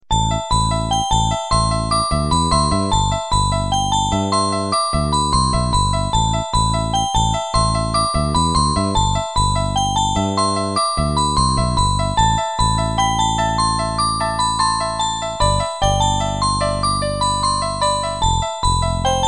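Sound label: halloween instrumental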